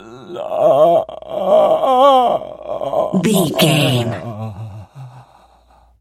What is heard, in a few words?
voice male death torture